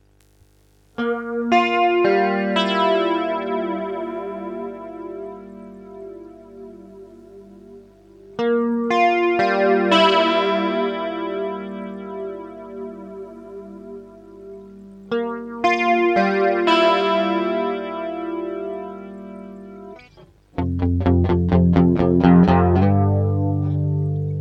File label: Shine Chimes